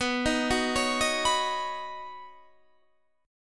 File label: B Major Add 9th, B, down, Guitar Standard; Badd9; arpeggiated; JGuitar